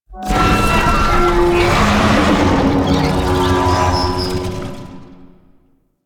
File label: Lasrian Tank Infested Vocal Idle; Enemies; Barks; Warframe